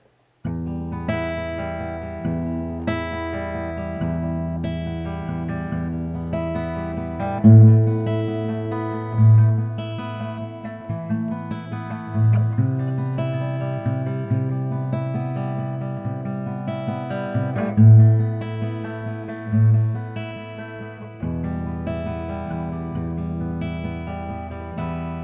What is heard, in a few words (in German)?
beh mic zupf